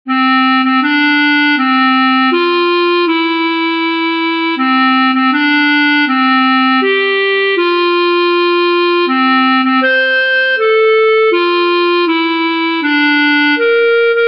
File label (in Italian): clarinetto tanti auguri Clarinetto